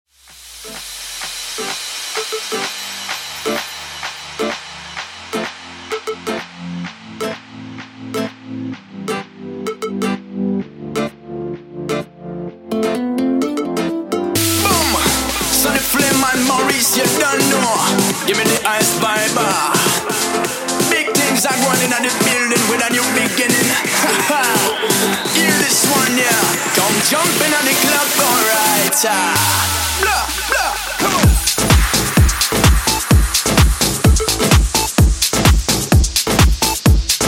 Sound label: Techno House 128 Bpm